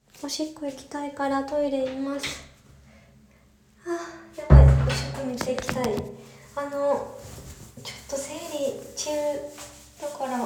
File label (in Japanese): 女の子の日(生理中) タンポン挿入しながら オシッコですッ